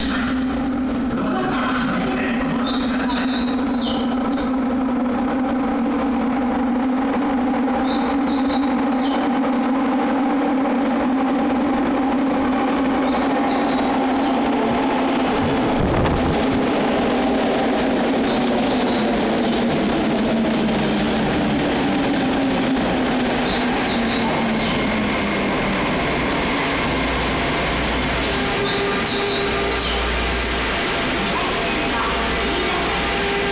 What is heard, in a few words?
shinjuku station tokyo metro